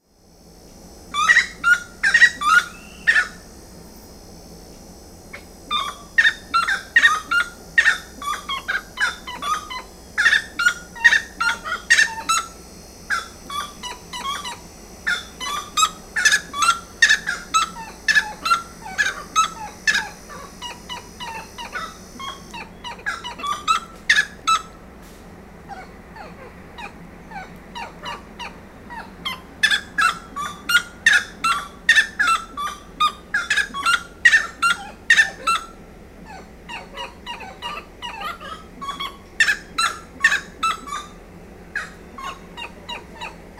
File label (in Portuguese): saracura tres potes